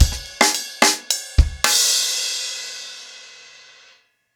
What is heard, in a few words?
Crash 110BPM, ACOUSTIC DRUMS; Sonokinetic - The Roots Drumloops